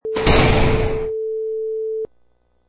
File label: Prison Door Slams